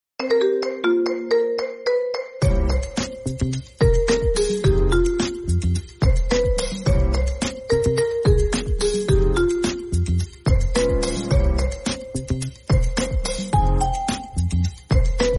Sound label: marimba instrumental